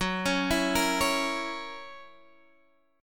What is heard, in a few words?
Gb 7th Gb down Guitar Half Step Down, chord